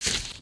grass, human step

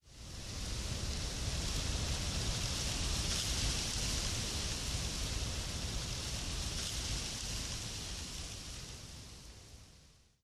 wind, outdoor ambient

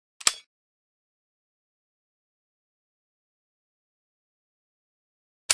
groza bore

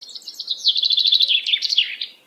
new birds, ambient outdoor

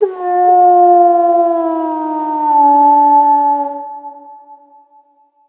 howling, ambient outdoor

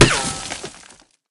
dirt, bullet collide

